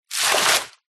nature water throw from plastic bucket onto deep water surface splash